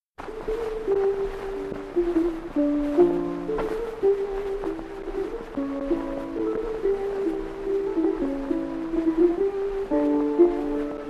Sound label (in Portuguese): Fado